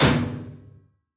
Eerie Fast Metal Hit; SoundsCrate

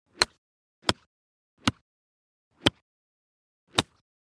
golf club iron mono; soundscrate

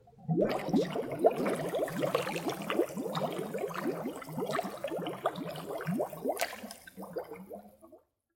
surface bubbles; soundscrate

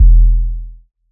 electronic kick long; soundscrate

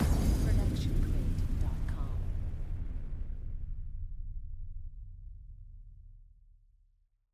Cinematic Hit

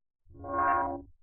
metal swing; soundscrate

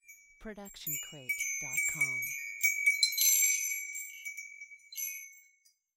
Wind Chimes B

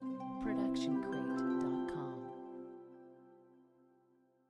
Inspiring Ident; SoundsCrate

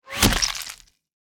body bullet hit

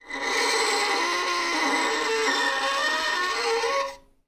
Creaky Screetchy Noise Long; soundscrate

